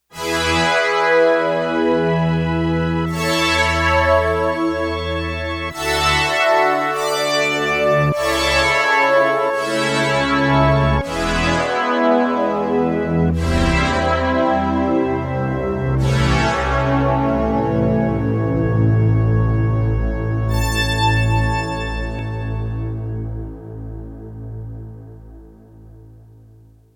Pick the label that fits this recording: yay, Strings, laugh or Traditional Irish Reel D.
Strings